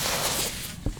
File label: dirt Digging; voxelverse